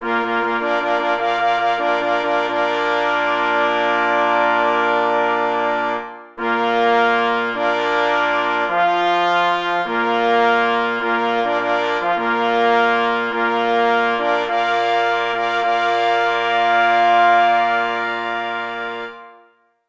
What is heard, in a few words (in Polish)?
Mysliwski zew na 4 plessy i 2 par force; Cz. Trybus; wersja oryginalna